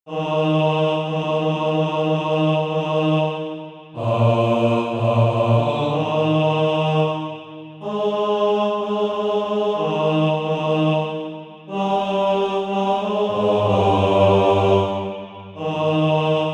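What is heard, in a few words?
bass, SATB Practise Music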